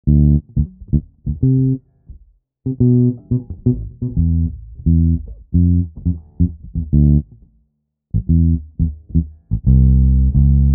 Bass

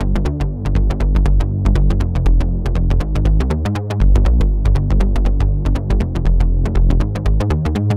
Synthwave Synth Pop

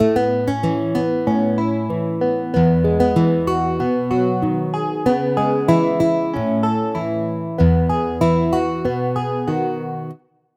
Hybrid Advanced Country; midi wizard

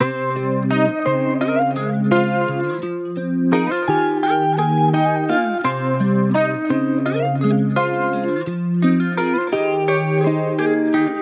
170 BPM Fm

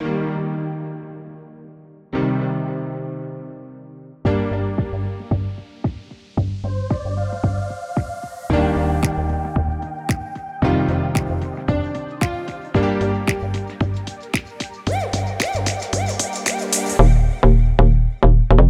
Deep House; Unison

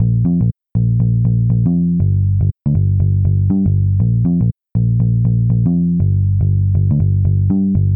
Neo Soul

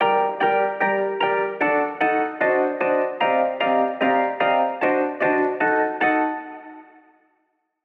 Chords Rhythm UK Drill; midi wizard 2; Unison

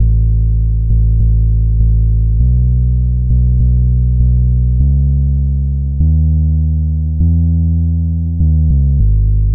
Folk Indie

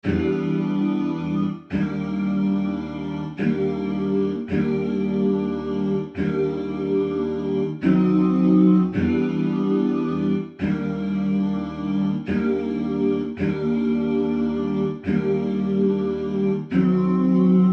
Vocals Psychedelic

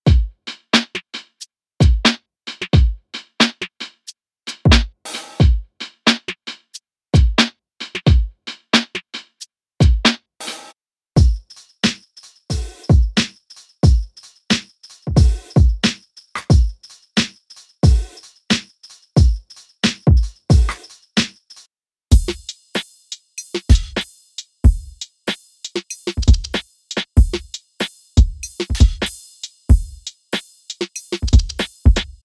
Drum Lo Fi; Drum Monkey; Unison MIDI Wizard Plug-In; Drum Demo

